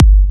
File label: Kick; WAProd Free Kick Samples 2018; WA Kick Pack 1